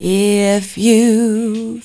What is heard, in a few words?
voice spectral, ly short